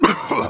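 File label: Engineer pain sharp, German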